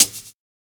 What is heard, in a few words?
Shake Place; FREE SFX PACK VOL 2